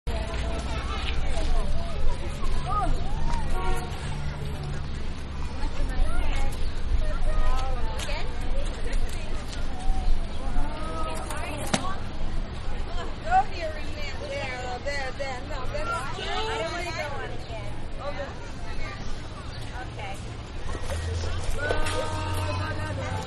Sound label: Children Playing In The Park With Parents; Playgrounds; Manhattan Production Music